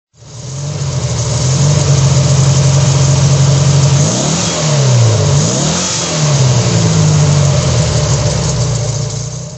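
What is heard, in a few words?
porsche speedster 1500 throttle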